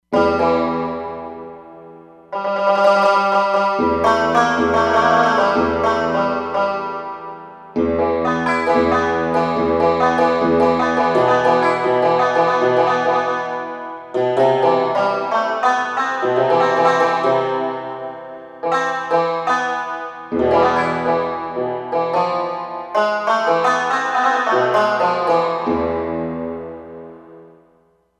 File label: Oud, E-mu Proteus-3; Factory patches